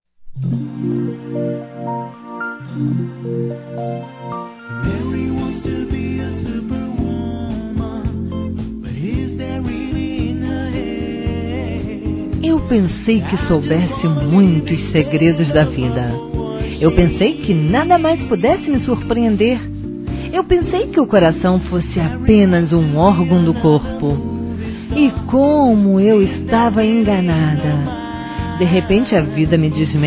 CONQUISTA F; Conquistas Cantadas paqueras; fem; Telemensagens; Telemensagens 2017

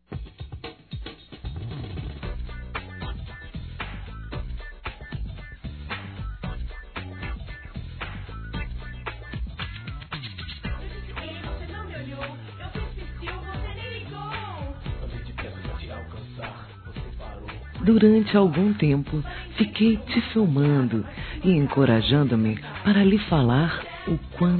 CONVITE PARA SAIR F; Conquistas Cantadas paqueras; fem; Telemensagens; Telemensagens 2017

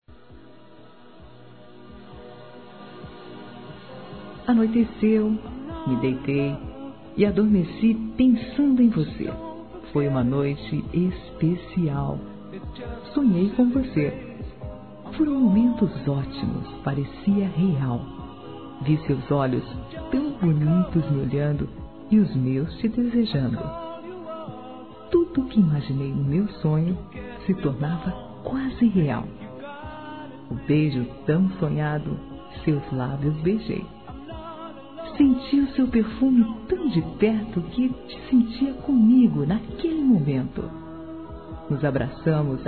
SONHEI C VOCÊ F; Conquistas Cantadas paqueras; fem; Telemensagens; Telemensagens 2017

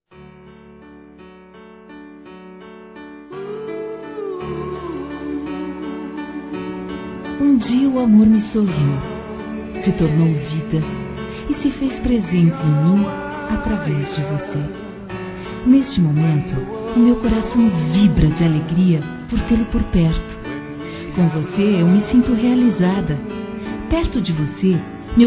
Feminino; Conquistas Cantadas paqueras; Telemensagens; Telemensagens 2017

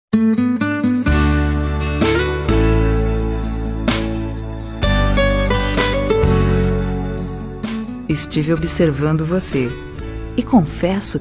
PAQUERA FEM; Telemensagens; Conquistas Cantadas paqueras; Telemensagens 2017

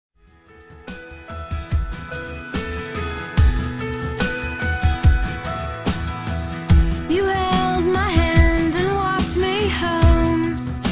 Conquista Feminino; Conquistas Cantadas paqueras; Telemensagens; Telemensagens 2017

